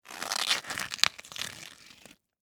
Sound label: Tear Cabbage Leaf, Texture, Tear, Cabbage, Leaf, Vegetable; Ripping; BlastWave